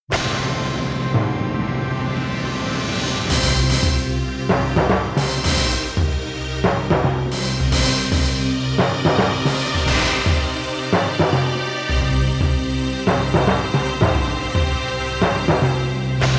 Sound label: Game Over; Impossible Mission 2025; Amiga